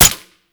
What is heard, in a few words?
Mech Full Auto, Pistol; Grineer; Amphis; Weapons